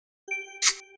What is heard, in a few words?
kiss; AvatarEmojiSticker Beyond